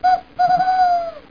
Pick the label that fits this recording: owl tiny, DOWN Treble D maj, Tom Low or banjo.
owl tiny